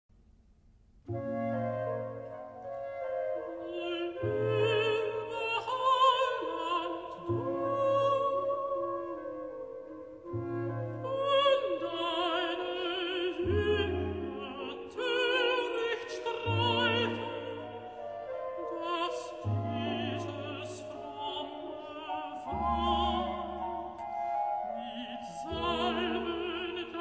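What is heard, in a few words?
recitative alto 2 flutes; bach; du lieber heiland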